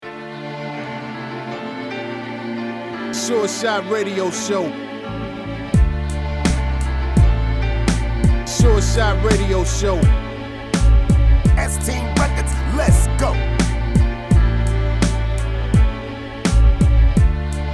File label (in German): Violine